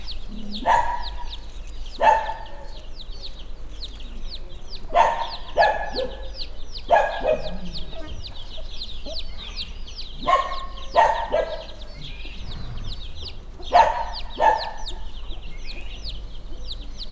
kutya, csibe magyar tyuk; állathangok; paraszt udvar

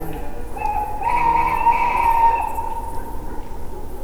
macskabagoly; termeszetben

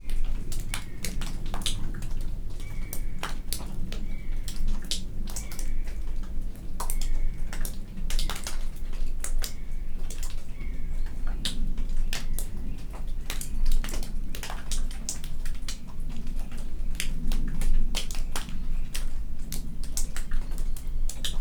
turistabeülőből, hó olvadó, visegrádi hegység

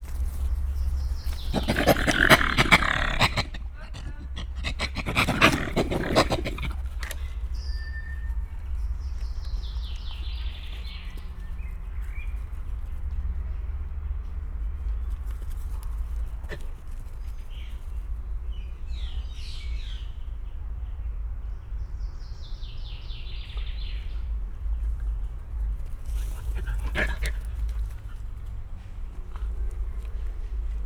rozsomákok; szegedi zoo 2011

